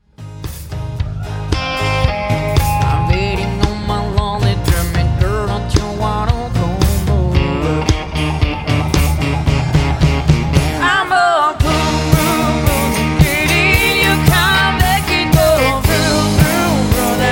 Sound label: lonely drum